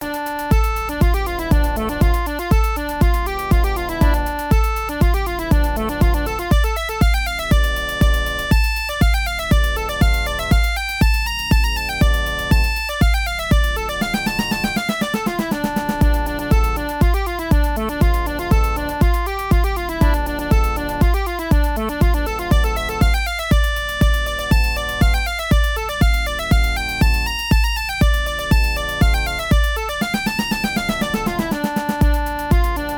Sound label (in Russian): Za kruglym stolom accordeon ver.; vsevolod rozhdestvenskiy